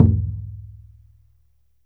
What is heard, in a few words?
MALAY DRUMS; Spectrasonics - Heart of Asia CD2